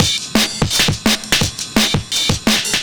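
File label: Drum and Bass Beat 170, drumnbass 170bpm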